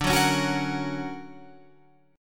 D Augmented Major 7th down Guitar Open F; D+M7 strummed; JGuitar

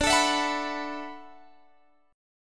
D 6th Add 9th, Guitar, Open G

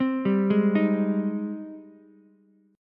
G sharp Major 7th G sharp, Ukulele; JGuitar

